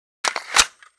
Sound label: p345 mag in; csof